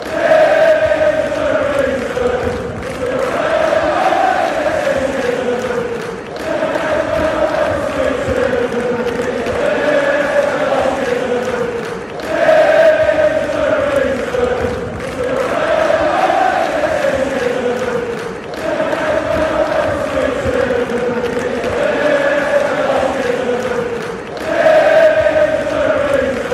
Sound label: player chant tottenham, fan chants; bentancur; united kingdom